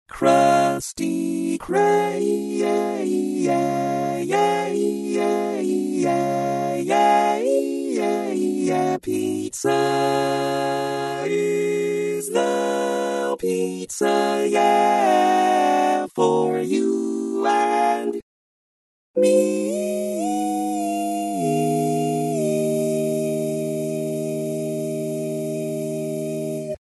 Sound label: Tenor, barbershop